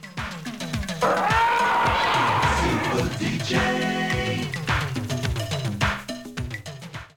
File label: radio one jingle; superdj